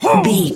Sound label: voice male hurt pain